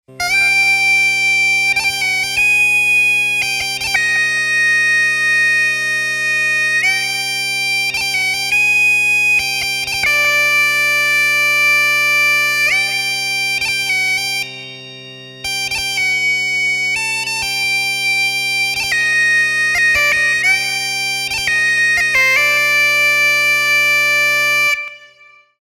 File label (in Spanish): Tono para descargar para o mobil